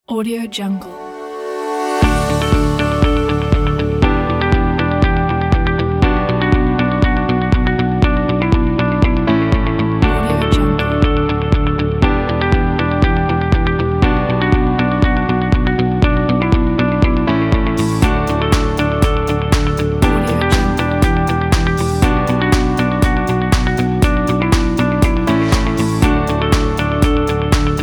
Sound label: success commercial